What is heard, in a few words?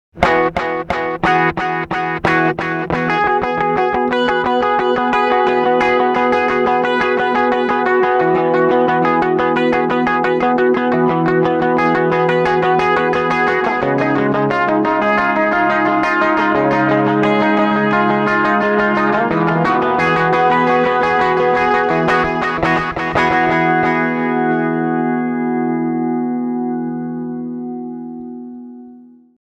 Digital Delay DD-6